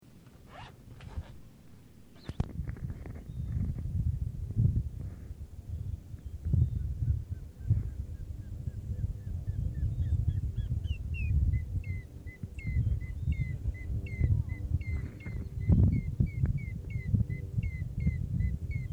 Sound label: Bird Sounds